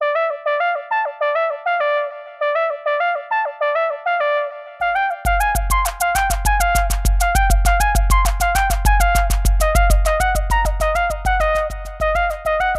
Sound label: Distant Horn